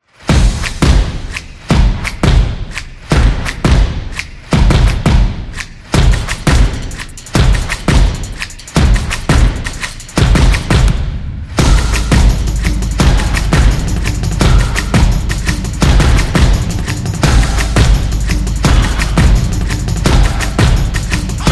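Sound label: Adventure Game, Upbeat and happy background music; soccer stadium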